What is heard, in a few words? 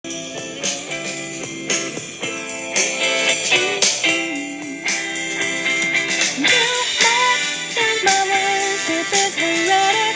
outro choruses